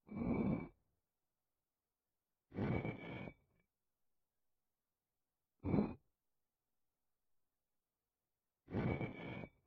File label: Scratching Sounds; Decay: The Mare